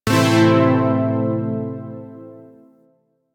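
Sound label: Shawzin Major Chord; Zariman Shawzin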